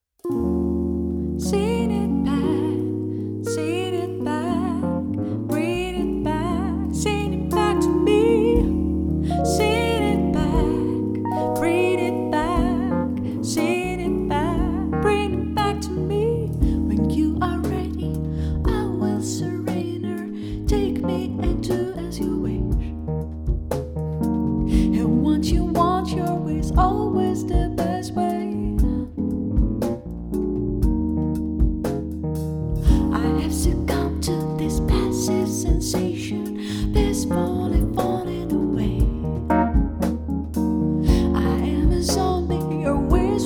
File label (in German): Loungeband Live, Piano Drums Gesang Band; Hannover; Swing Jazz